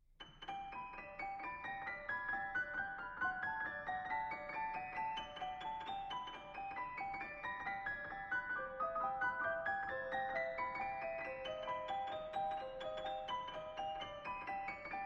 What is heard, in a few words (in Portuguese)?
caixinha de musica quebrada